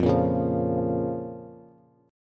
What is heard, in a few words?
F Suspended 4th Sharp 5th F, Bass